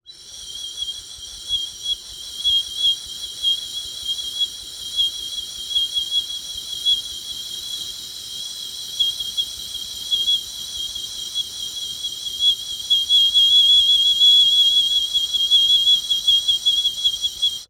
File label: Birdwhistle, clay, painted, dry, airy, wispy, constant, long, quiver, random notes; Eiravaein Works; Vaeyan IV